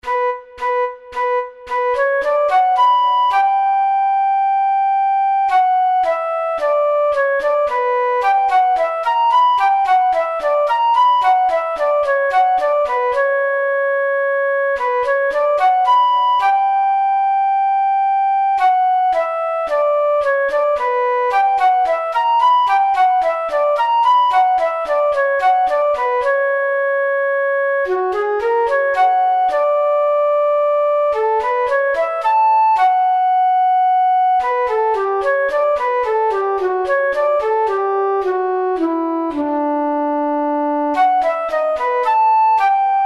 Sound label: Flute; Bach Johann Sebastian; Suite H-Moll Nr2 BWV1067; Menuet T110